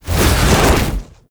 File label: Movement Dash; Warframe; Uriel